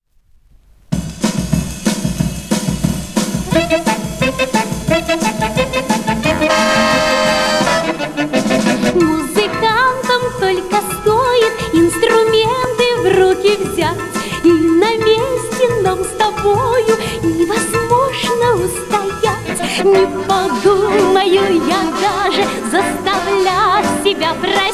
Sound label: rap